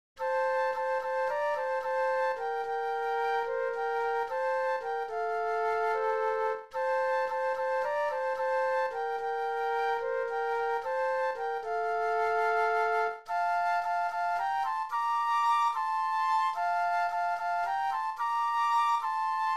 Flute Duet

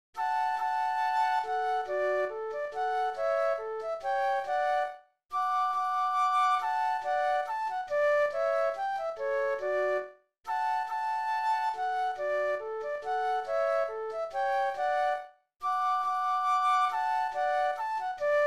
German Dance Flute Duet; classical; Mozart; K. 602 no. 3

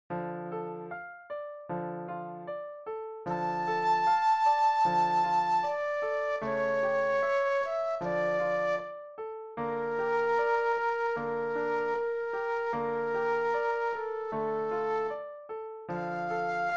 Aria Flute Solo; classical; Gluck; Orpheus

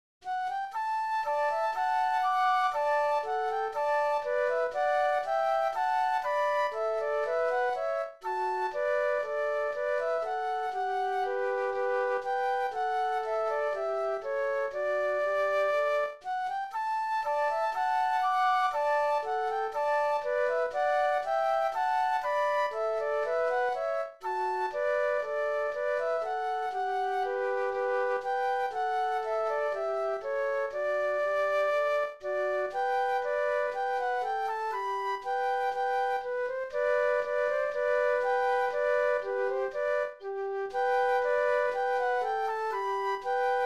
bourree flute duet; classical; Telemann

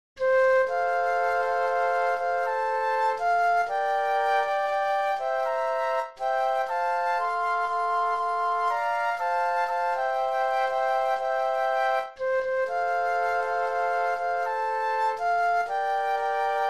Molly Malone Flute Trio

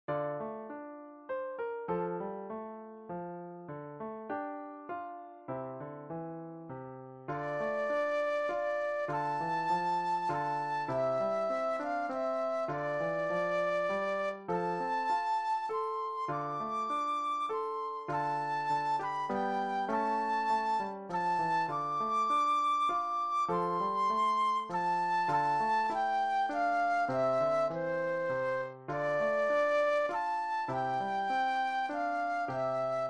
Scarborough Fair Flute Solo

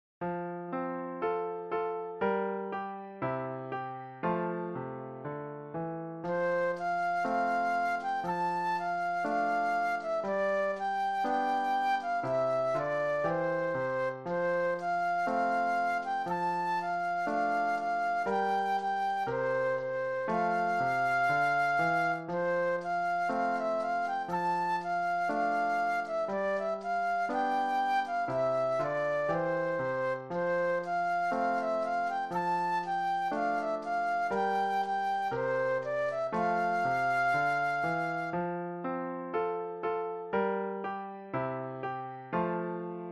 The Muffin Man Flute Solo

